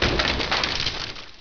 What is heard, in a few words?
shatter; HEXEN2